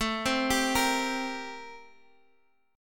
A-Minor 7th Flat 9th, chord

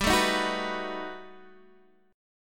B 7th G; B7/G; strummed

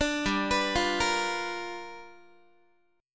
G 7th Sharp 9th G chord, Guitar; G7#9 arpeggiated; 17,15,14,15,14,x

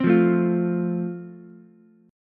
F Major, Ukulele; strummed

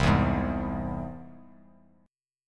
C Augmented down; strummed; JGuitar

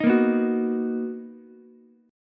B Major 7th B down Ukulele; strummed; JGuitar

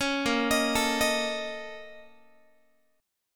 A sharp Minor Major 11th, Guitar; 16,18,18,19,19,x; 4A,4E,5A,5D,5G#,6C#; arpeggiated

